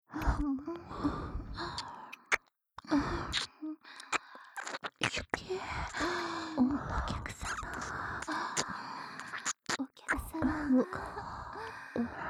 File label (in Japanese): しゅきしゅき淫語塗れの両耳キス舐めループ